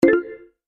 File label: Percussive Close Ding